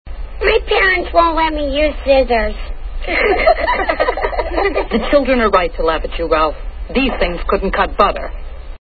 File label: laugh